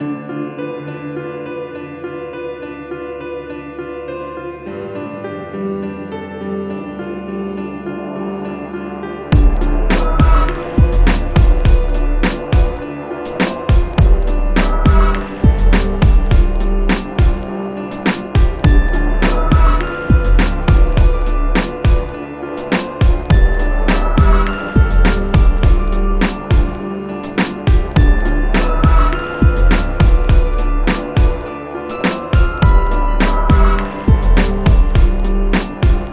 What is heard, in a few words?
breakbeat piano loop